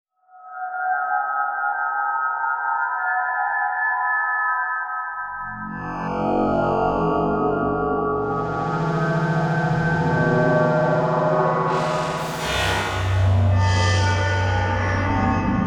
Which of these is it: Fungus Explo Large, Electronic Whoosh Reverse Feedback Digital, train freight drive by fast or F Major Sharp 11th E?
Electronic Whoosh Reverse Feedback Digital